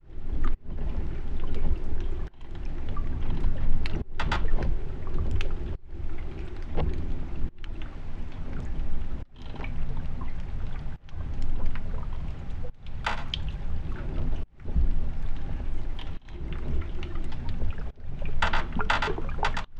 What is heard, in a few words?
Hydrophone, Underwater, Crazy; underground sounds